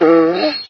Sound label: whine, dog; There; ThereClient